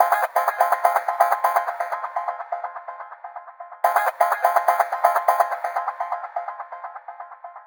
125BPM Minimal Melody; VFH1 125BPM Minimalsau Kit

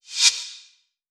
Cymbals Reverse Crash; Vengeance Samples

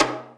Percussion; Vengeance Samples

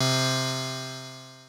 synth poly c2

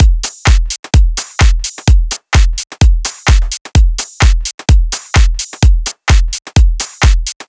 128BPM Drums; Vengeance Samples; Hopper Kit

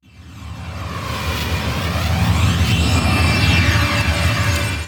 Sweeps Medium

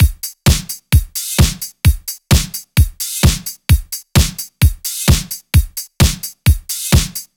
130BPM Jig Kit; Vengeance Samples; DK Jig Kit

